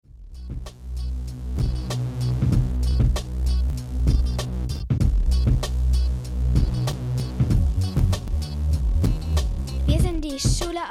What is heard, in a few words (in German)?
Hymne Grundschule Köllerbach